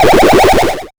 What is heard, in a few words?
video games